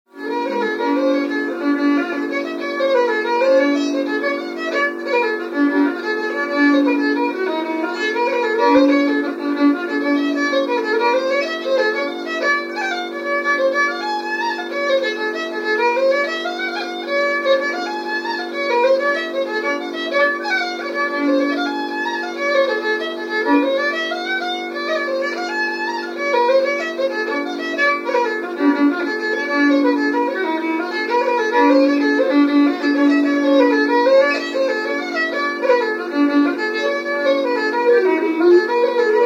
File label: jig; Geese in the bog